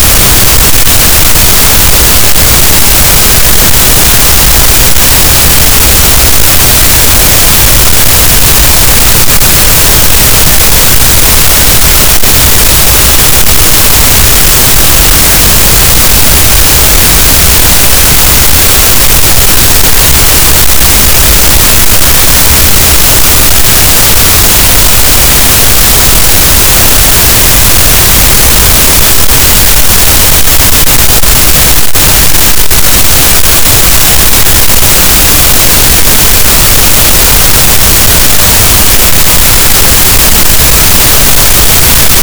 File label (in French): cachalot, Physeter Macrocephalus, gaussian noise